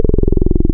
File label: Saw, wavetables; Animoog